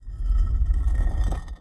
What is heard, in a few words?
scroll monolith